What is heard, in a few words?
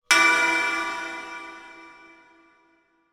impact metal large reverb resonate clang